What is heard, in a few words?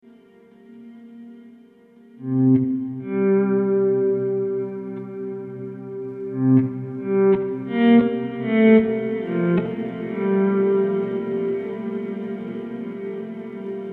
delay diff